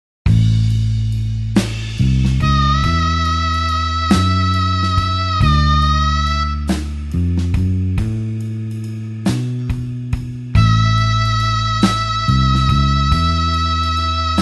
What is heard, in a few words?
blues harp slow A